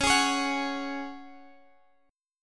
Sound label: C sharp Suspended 4th, Guitar Standard; strummed; x,x,11,13,14,14; JGuitar